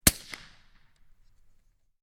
Rifle 22 calibre firing suppressed, Small calibre rifle being fired with a suppressor; Small calibre rifle being fired with a suppressor attached; Rifles